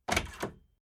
Household Door Small Door Open Handle Fast Latch; Miscellaneous Door

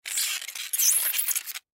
Picture Frame, Picture Frame Scrape Glass; Scrapes; Foley; Objects; Blastwave

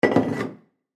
Ship Axe Put On Wall Holder Fast; Transportation Watercraft; Boat Sound Effects; Blastwave

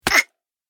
Hit The Gremlin, monster; Horror Sound Effect

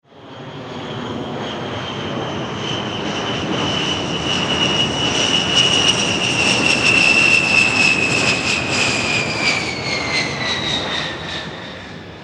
Jet A10 Pass By Military; Transportation; Airplanes; Vehicles

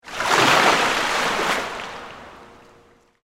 Pool Indoor Splash, Water; Pool Sound Effects; Pools (Sound Effects:Backgrounds); BlastWave

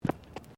FOOTSTEPS FOOTBALL BOOTS CONCRETE STEP

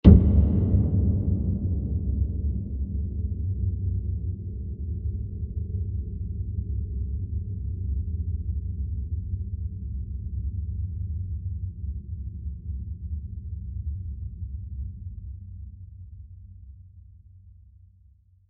Large Diffuse Impact; Blasts; Impacts; Sound Effects:Impacts; CraftedSignals

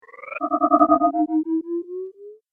Time Warp, sci fi

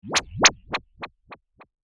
Mind Wave Attack, laser sound; science fiction sound effect; Andrew Fleming

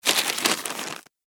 Rough Paper Noise; Paper Sound Effects; office

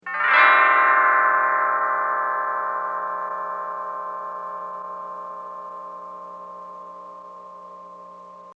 Glissando Bell Creepy Ascend; Bell Sound Effects; Bells (Sound Effects:Musical)

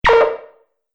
Multimedia Button; Andrew Fleming

